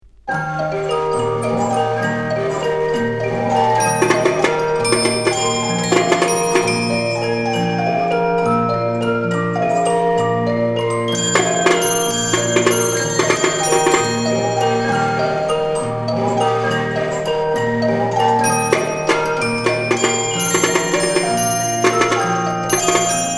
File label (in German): musik mechanisch